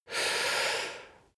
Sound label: male breath medium in; menschen; atmen; soundpool